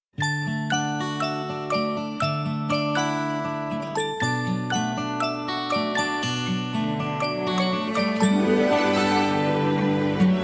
sweet and cute

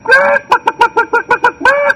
hen sound effect

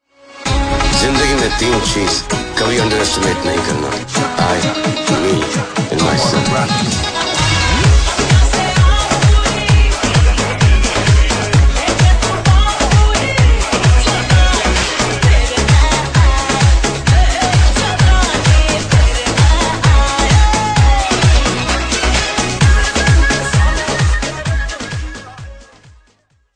ringtone, mashup

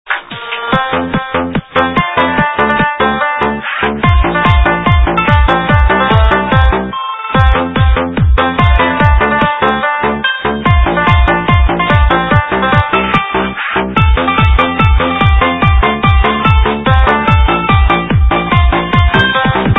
dance

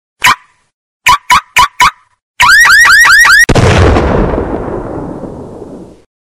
ringtone alarm

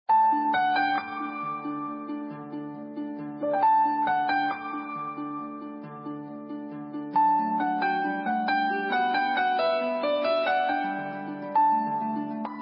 classical ringtone piano ringtone; huawei piano ringtone

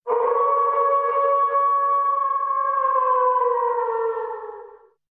wolf howl

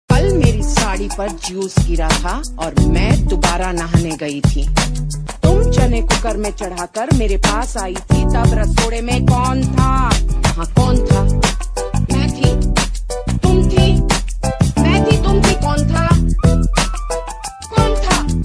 Techno

